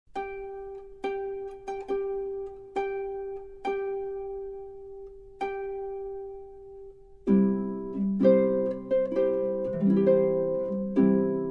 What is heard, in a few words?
Bridal March